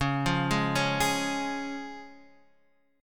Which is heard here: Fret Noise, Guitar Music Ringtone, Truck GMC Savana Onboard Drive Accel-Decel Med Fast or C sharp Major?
C sharp Major